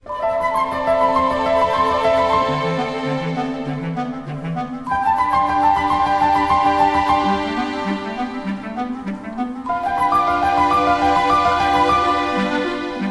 Nokia Lumia

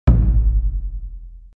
boom jazz udar

